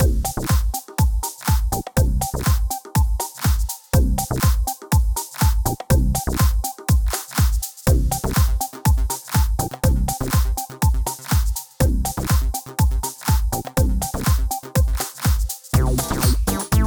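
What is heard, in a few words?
Loop Menu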